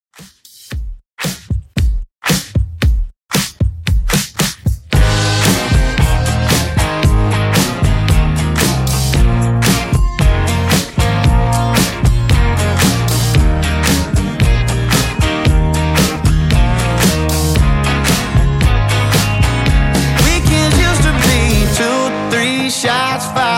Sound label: Country Re Drum 114